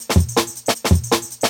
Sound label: 160, Jungle Breaks; Original Jungle Breaks